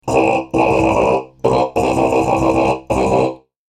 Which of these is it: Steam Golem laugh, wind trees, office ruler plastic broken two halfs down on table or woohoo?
Steam Golem laugh